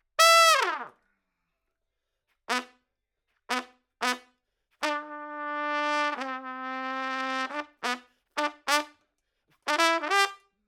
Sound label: Trump